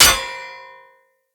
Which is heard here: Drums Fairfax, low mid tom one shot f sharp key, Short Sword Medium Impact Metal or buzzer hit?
Short Sword Medium Impact Metal